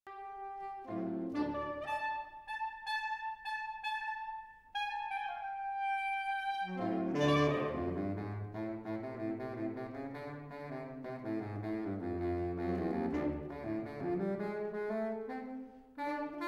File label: Sax